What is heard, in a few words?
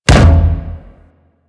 Pilot Breakout Hit One; Warframe; Amalgam Carrus